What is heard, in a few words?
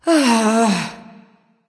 nv sigh; smite; awilix